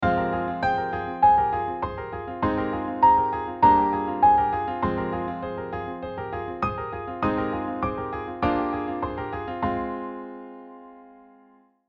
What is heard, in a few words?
Imitation Melody